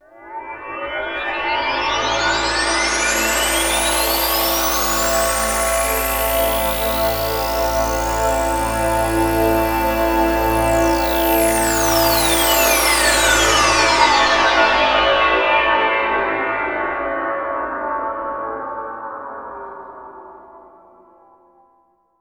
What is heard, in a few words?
Polymod Sweep C; Trance Formation